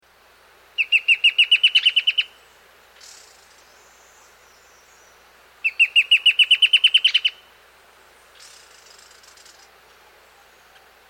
Dusky Antbird, Nature's Songs, S. America

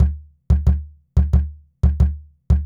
JUNKYARD RHYTHMS

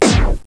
BLASTER, SPACE

